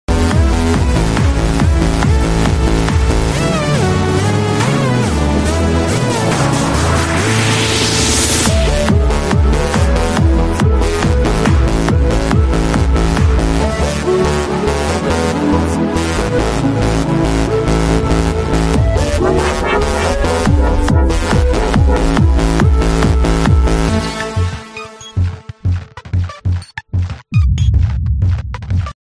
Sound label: happy new year nokia